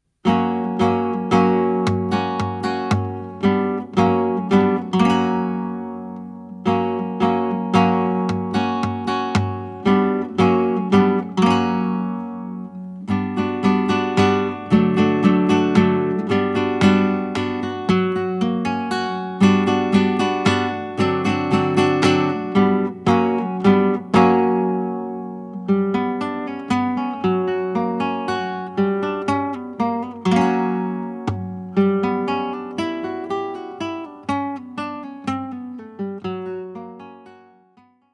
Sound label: soleares, flamenco